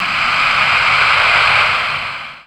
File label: Calm Breath; Jungle; Blu Mar Ten - Jungle Jungle - 1989 to 1999 Samplepack